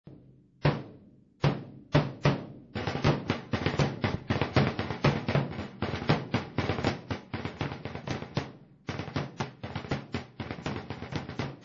imagenes, tambores; Las imágenes 2; SAMPER

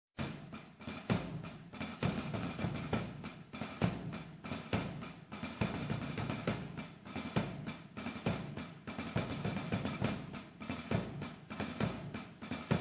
imagenes, tambores; Andorra; Las Imágenes

